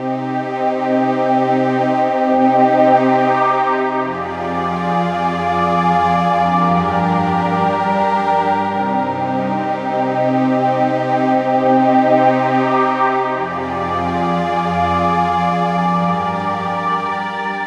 Pad, Synthesizers

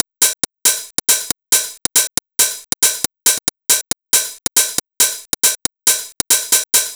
Hi-Hat Loop, Drums, Dance Beats

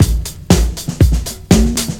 LOOP; SoundFactory; DRUMLOOP

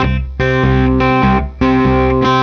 Electric Guitar, Loops; Guitars

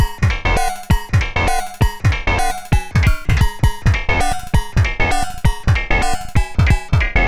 Electronic Percussion

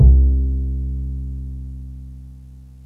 STRG BASS; SoundFactory

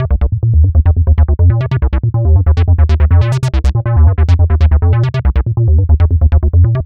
Synth Bass; Basses

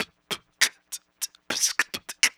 Beatbox; Beatboxing

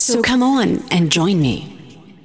vocals; SoundFactory; SMPMANIA

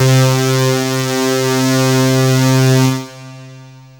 DIGI SAW; SoundFactory; SMPMANIA

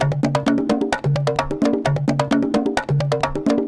Acoustic Percussion

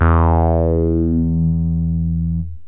yamaha rm1x synth bass and lead

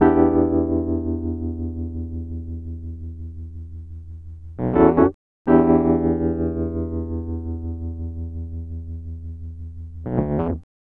Electric Piano, Loops, Keys

